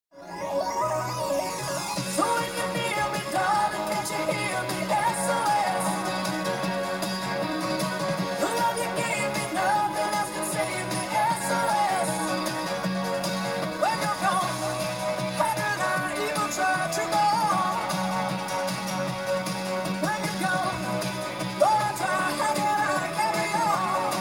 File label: Dance Song